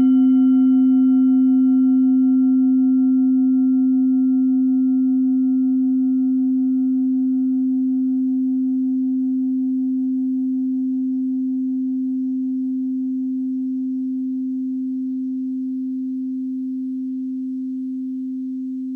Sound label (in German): klangschale ladakh